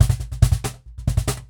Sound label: Cajon Fill; musicradar